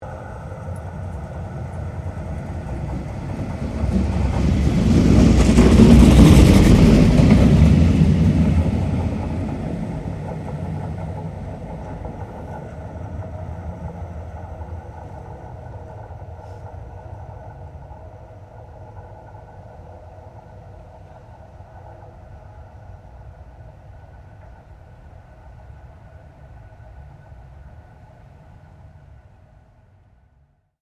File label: Tram Melbourne C Class Passing Slow; Various Melbourne trams; Streetcars; Vehicles